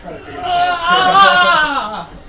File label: ahahaaaaaahhhh